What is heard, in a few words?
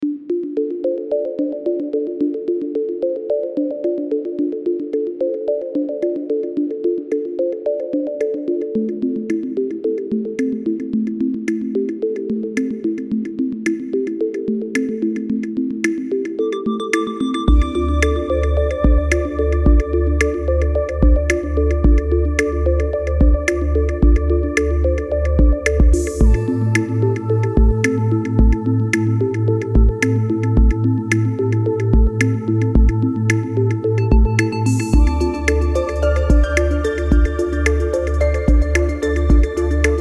space chillout